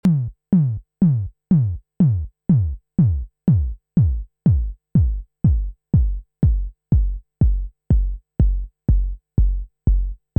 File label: Techno Kick Moog Kick